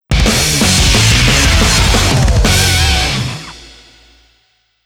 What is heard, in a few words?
Battle Win; Pachislot Devil May Cry 4 Original Sound Track 2013